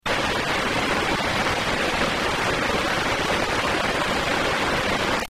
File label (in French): telephone fil